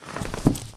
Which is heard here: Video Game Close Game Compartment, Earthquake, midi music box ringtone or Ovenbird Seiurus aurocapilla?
Earthquake